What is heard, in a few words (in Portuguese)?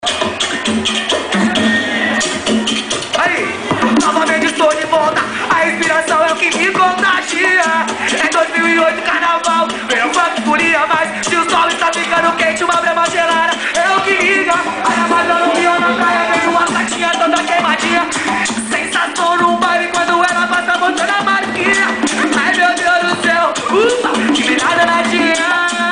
2 (ao vivo)